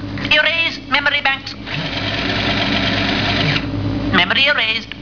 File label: Recycle Bin